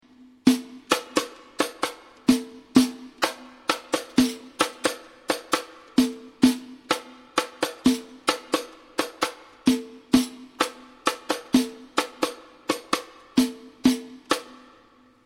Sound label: riq